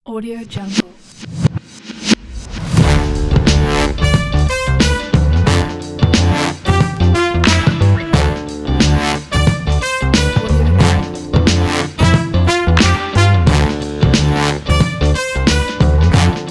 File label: old school funky podcast intro; robertreid